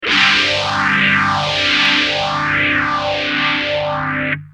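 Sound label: guitar, Intelliphase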